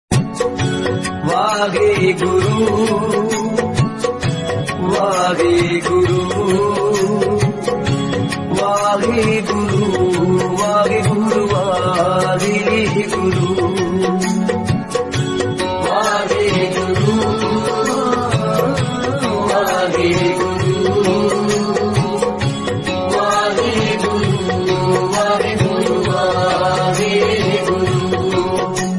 Punjabi Bhajan Ringtone

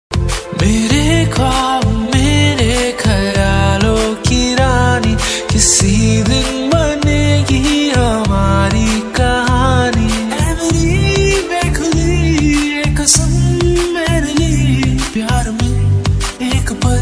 Love Ringtone

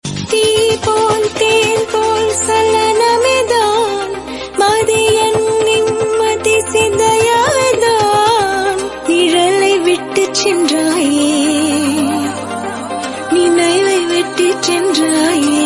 Tamil Ringtone

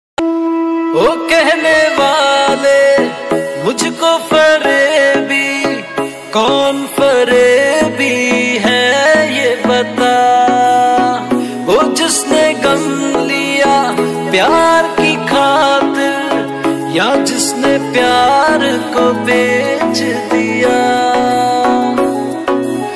New Love Song Ringtone

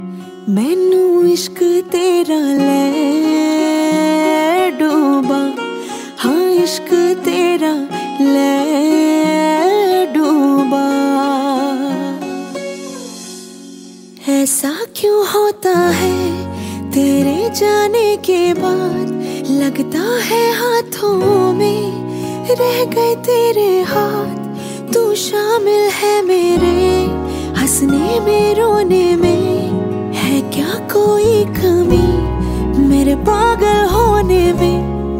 Bollywood Song Ringtone

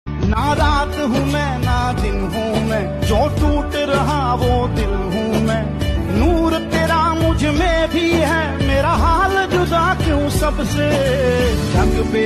Breakup Ringtone

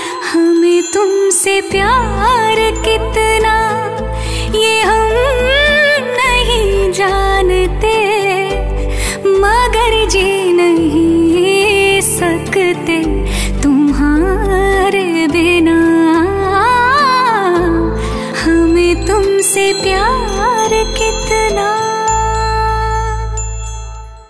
New Movie Song Ringtone

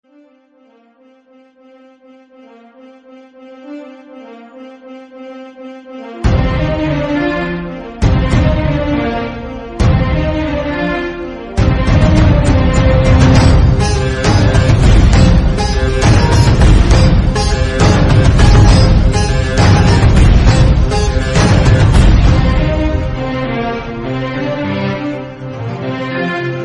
Background Music Ringtone; RRR

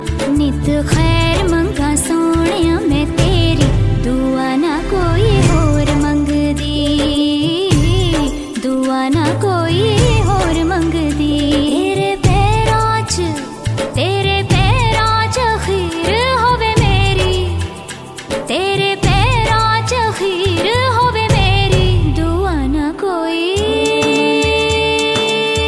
Female Ringtone

